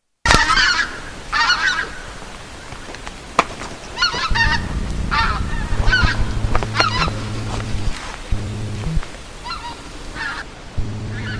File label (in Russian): Klich gusej